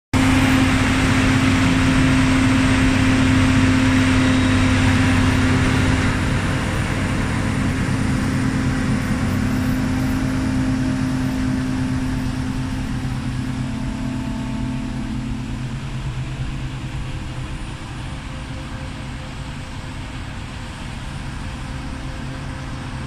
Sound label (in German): Mähdrescher tankt ab und fährt weg; Modulkoffer